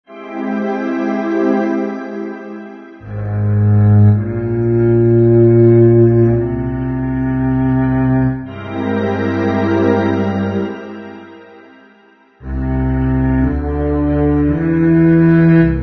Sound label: tenso cello pad